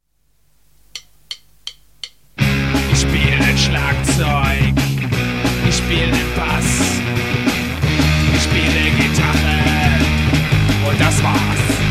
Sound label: RockNRoll